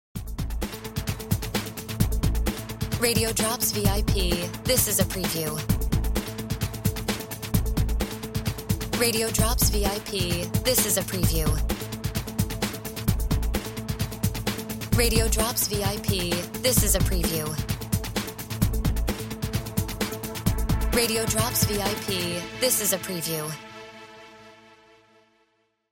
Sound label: No FX Music Bed